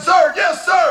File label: Vocal Phrases; Vengeance Samples; Vocals